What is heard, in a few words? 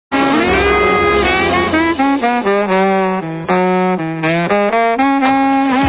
solo